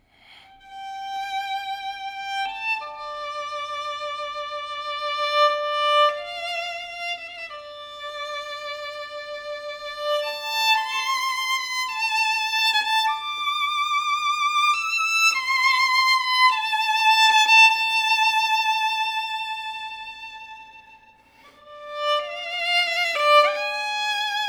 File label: Violin